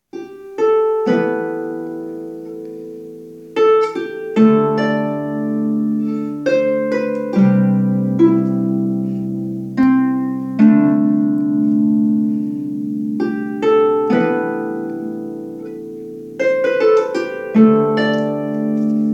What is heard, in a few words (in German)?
Harfe; Klangproben Instrumente